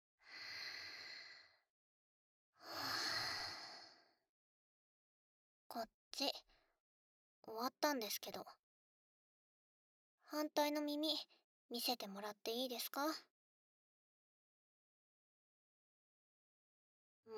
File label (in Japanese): 耳かき左; メイドのマナちゃんに癒してもらおう